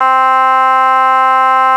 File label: reed, SQ80